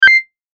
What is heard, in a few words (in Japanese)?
決定